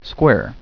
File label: Square